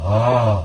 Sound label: snore